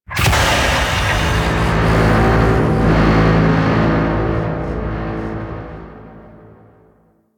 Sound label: Cargo Train Exterior Coupler Detach; Warframe; Corpus; Railjack